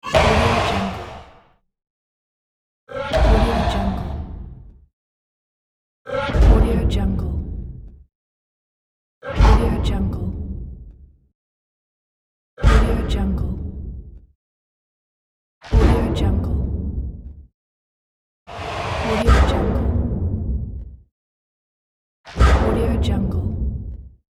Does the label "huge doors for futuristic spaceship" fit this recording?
Yes